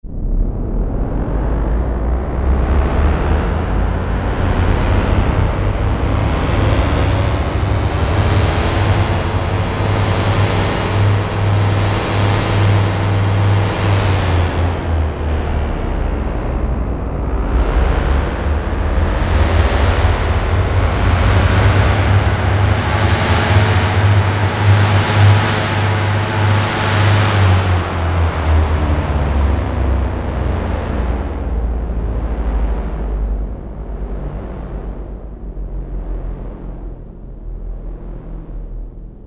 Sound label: STARTING UP, Roland Jupiter-8; Factory patches